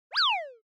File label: Motion Slide High